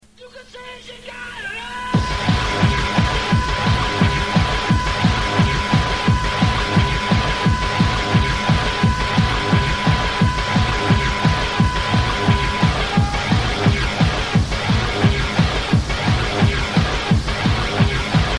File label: guitar tune; electric